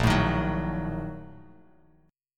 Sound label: Bb-Major 7th Suspended 4th Sharp 5th, Bass Standard, chord; strummed; JGuitar